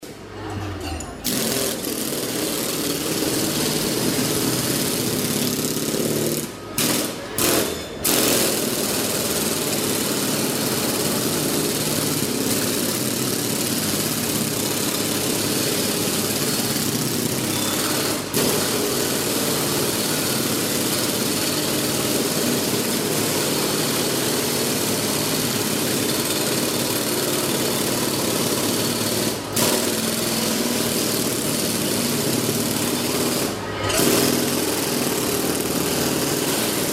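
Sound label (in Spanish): Sonidos martillos neumáticos en calle O'reilly, La Habana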